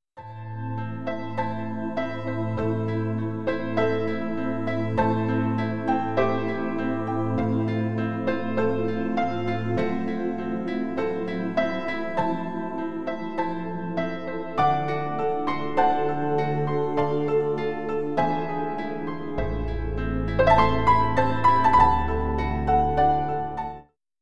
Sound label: Classical